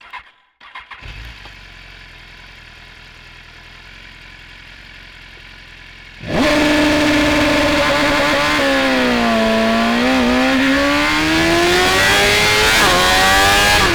BMW S1000RR ONBOARD Driving Sequence Turn On Start Long And Short Acceleration, 4-Stroke 999cc; 2015; Gearshifts; Dramatic Cat